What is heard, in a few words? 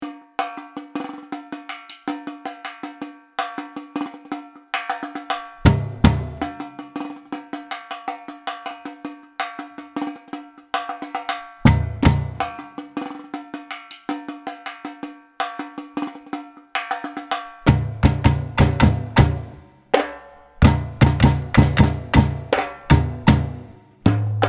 intro, samba; Pirate Samba